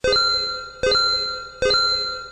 item finder; P3D-Legacy; 2.5DHero

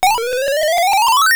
Exp Fill, Battle; P3D-Legacy

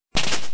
pound, Battle Effects; P3D-Legacy

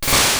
Ember Start, Fire Attacks; P3D-Legacy; Battle

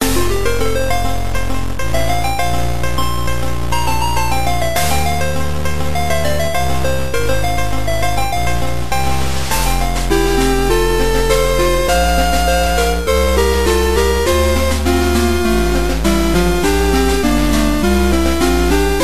Route Music; P3D-Legacy

